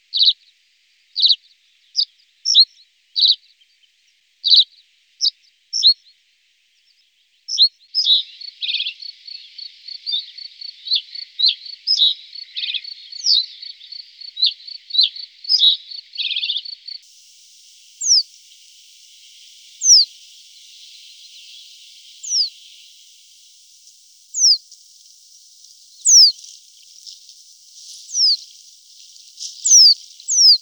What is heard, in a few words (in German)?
Rohrammer